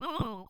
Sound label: surprise moderate; VIVAE; vocaltrax